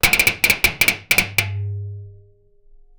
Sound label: aggressive snapping sound, cello